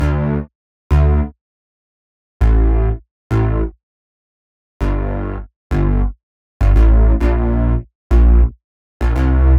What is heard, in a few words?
D Bass Tube Tone, 100; Zero-G; Future Wave